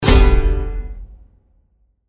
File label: hit; BullStampede